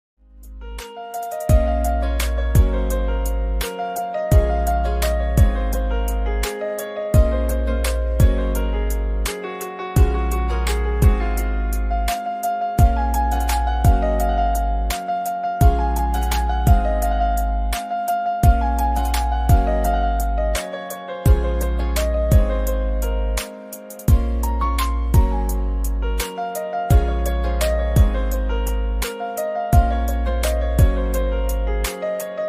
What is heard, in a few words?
instrument ringtone